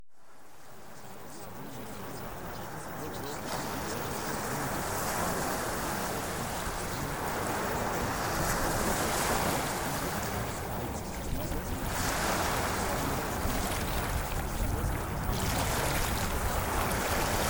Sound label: Ocean Waves